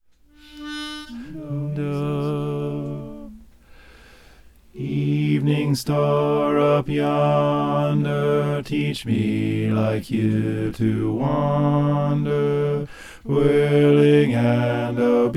bass

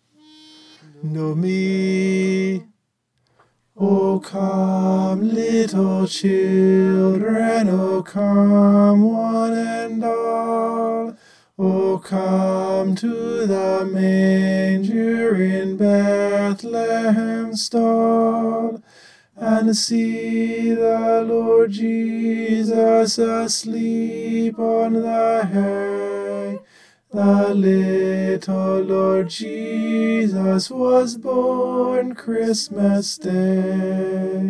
o come little children tenor

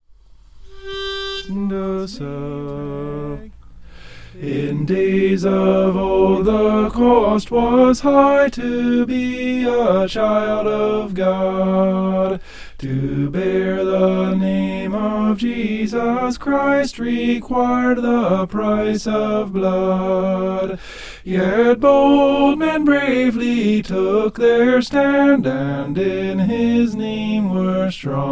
soprano; in days of old

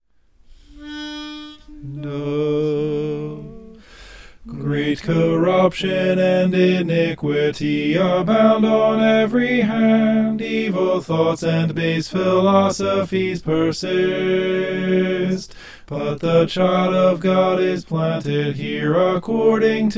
soprano

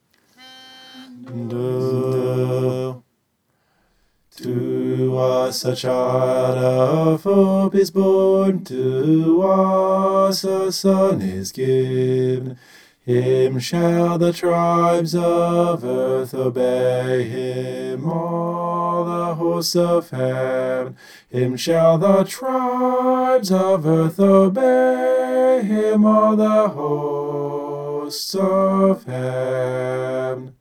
bass

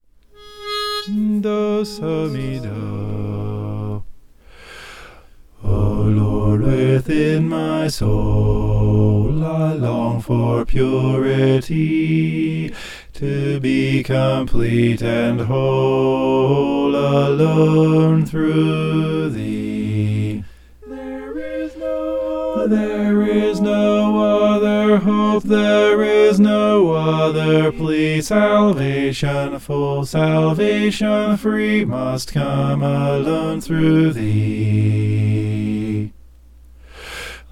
bass

